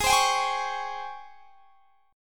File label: G sharp Major 11th; x,23,22,24,21,21; JGuitar